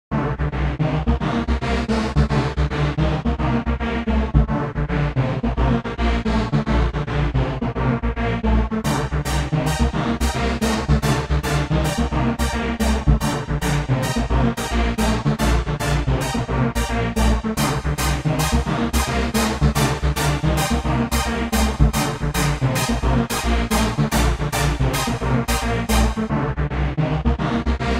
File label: unpleasant wind; yttd